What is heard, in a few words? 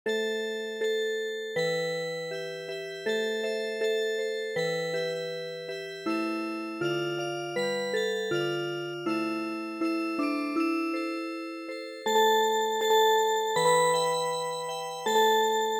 Music Box, midi; Sakura